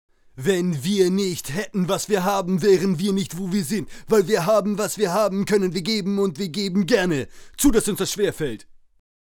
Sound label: Rap